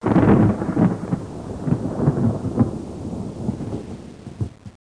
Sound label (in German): donner